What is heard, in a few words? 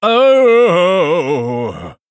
Male Human Death